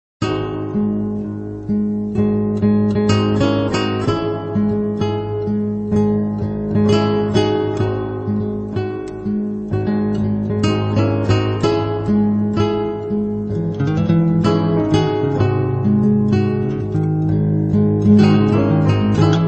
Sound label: Sensual Spanish Waltz